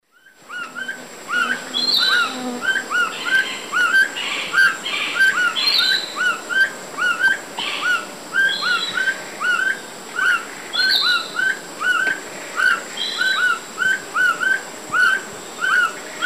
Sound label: Neoctantes niger, duet; S. America; Eastern Lowlands